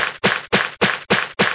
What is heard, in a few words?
dirt break sound; minecraft